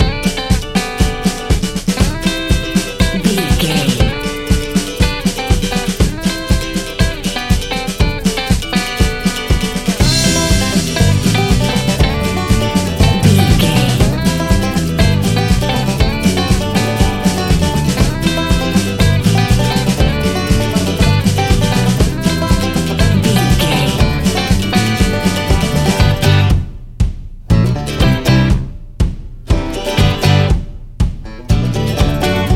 foot stomping folk, Foot stomping country folk music. A raw and earthy piece of traditional folk music